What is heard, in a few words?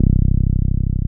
TB-303